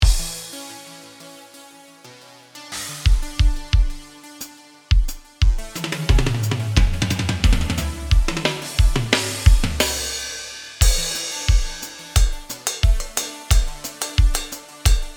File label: Drum; Silex; Silex Drum Lite